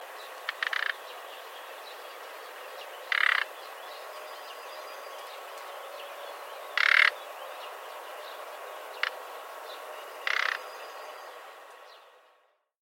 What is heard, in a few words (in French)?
rossignol philomele